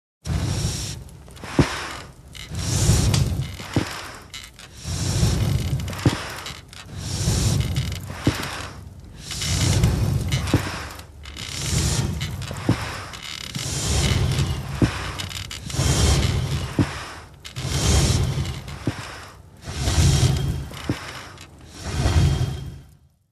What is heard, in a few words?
Feeding Fire with big bellows